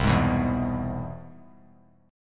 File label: C Augmented Major 7th; strummed; JGuitar